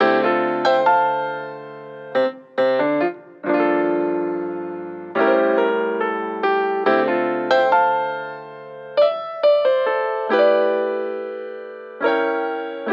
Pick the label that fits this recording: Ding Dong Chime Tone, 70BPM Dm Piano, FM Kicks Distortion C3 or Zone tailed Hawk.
70BPM Dm Piano